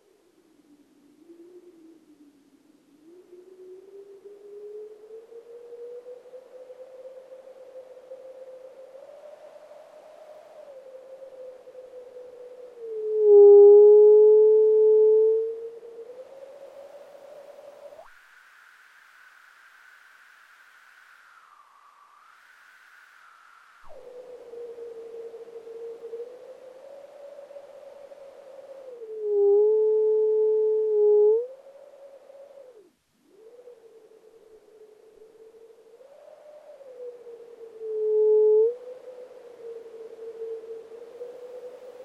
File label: noise; Plague Bearer